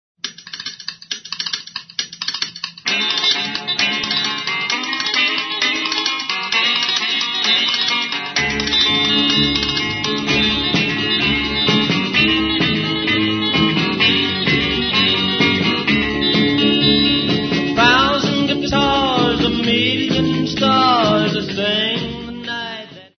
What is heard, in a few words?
a thousand guitars